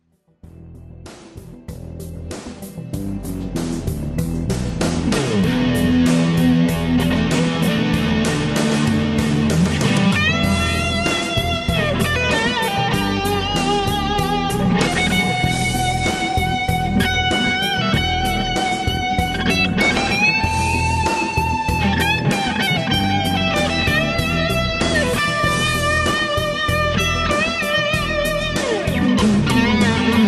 solo, Large Beaver

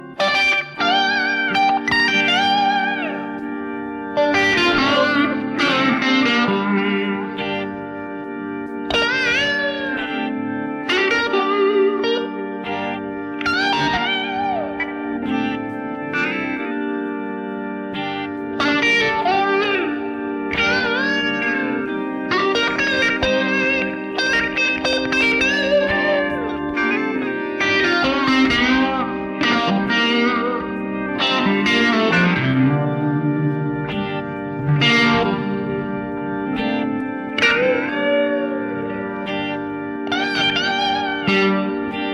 third guitar solo extraction

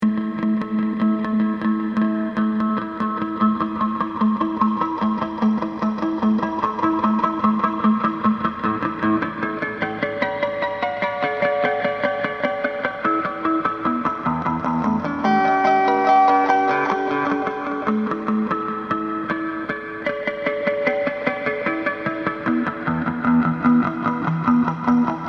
Harmonics delay

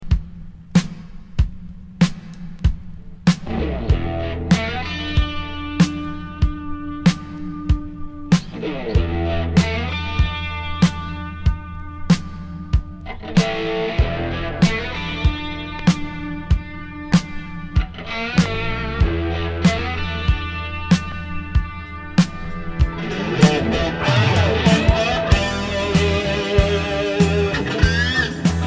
solo and outro; fr 7 inch Italian Single Version

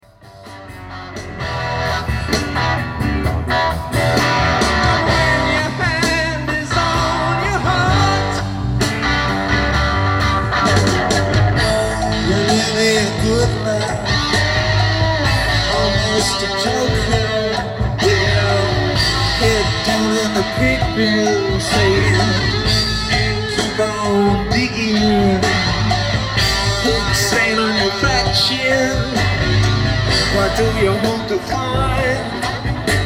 Solo with Power Boost and Big Muff

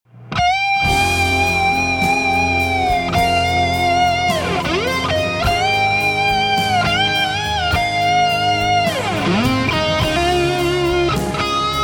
solo Strat Muff; EMG

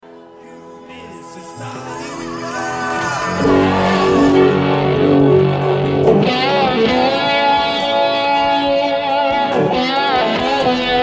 Isolated Guitar Solo; Torino 1994

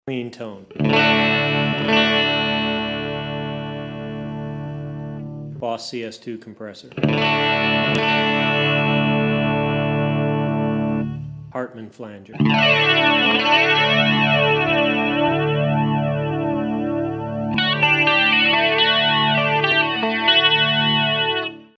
Tone Build

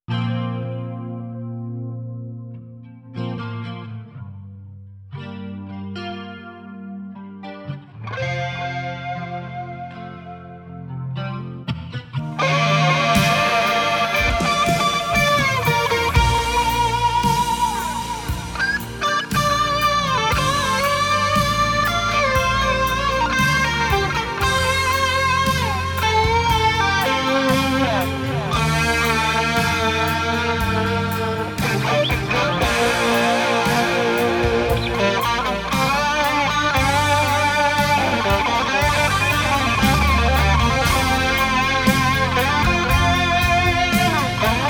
solo EMG Strat Twin Reverb; CE-2

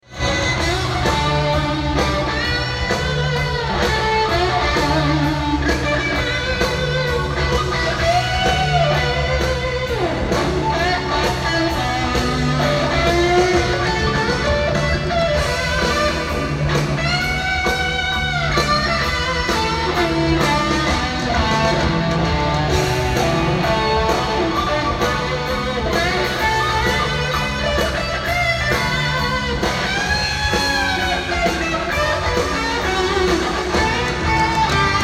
solo Rams Head Big Muff

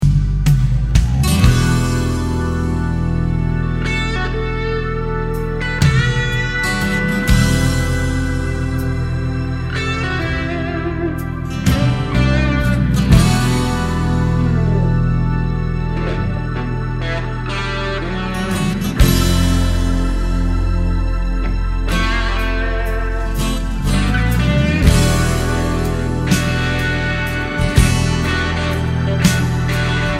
Solo Dry Mix

